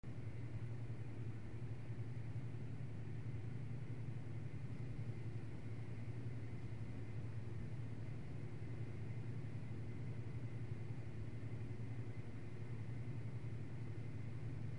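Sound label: Lobby Office Building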